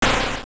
Laser; Beamos (rotating eye) firing a laser; Oracle

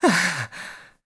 Stamina Out; Skyward Sword; zelda

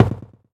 Gallop; Lord Bullbo; Eldin

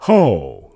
Ho; Bearded Baron: "Ho!"; zelda

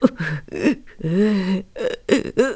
Grandma Cry; Grandma crying

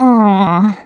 Sleepy; Link being sleepy (intro scene); zelda

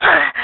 Shock Burn; Link getting shocked or burned

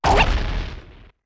Deku Link Launch; Launching from a Deku Flower (four different shouts)

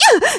Hurt; The Lady getting hurt

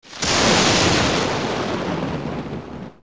Lightning; Phantom Ganon; zelda; OOT

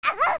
Young Throw; Zelda

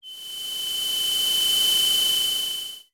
Medallion Appear

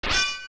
Deku Seed Hit; A Deku Seed hitting something; zelda; OOT

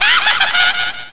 Bound Chest Spirits Laugh; Various noises made by spirits escaping the bound chest

